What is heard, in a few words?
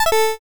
connect fail